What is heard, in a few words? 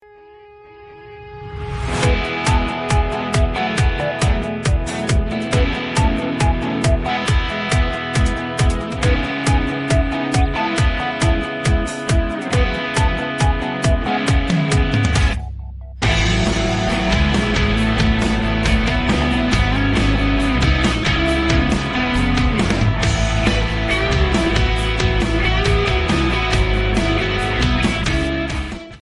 leisure s4 ringtone